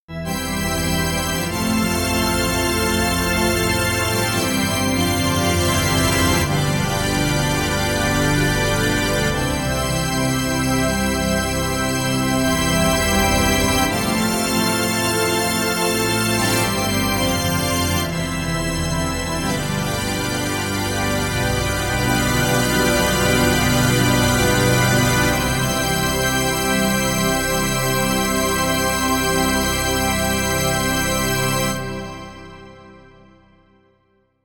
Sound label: Church Organ Perfect Cadence